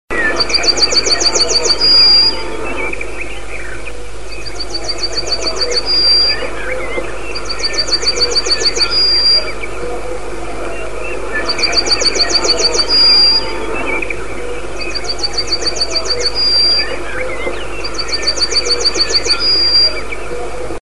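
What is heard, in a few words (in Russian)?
ovsjanka, bunting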